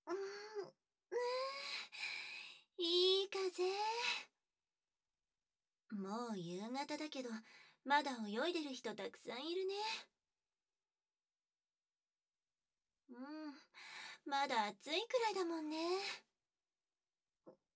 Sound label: Off SE, ASMR